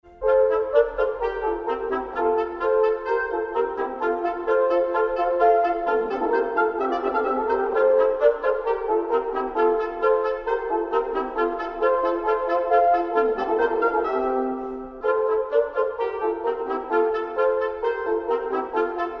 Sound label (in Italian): musica